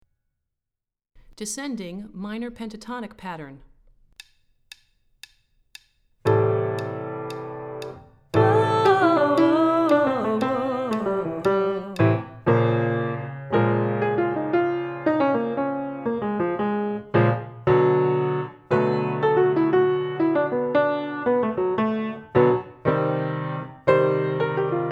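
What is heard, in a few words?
Descending Minor Pentatonic Pattern - Low, Vocal Warm-ups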